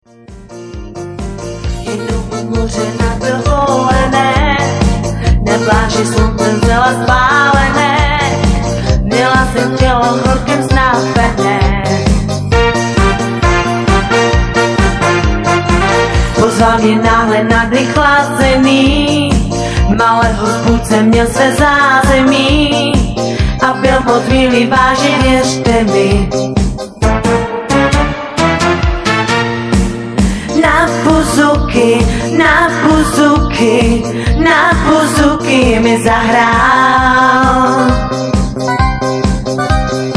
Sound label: BOUZOUKI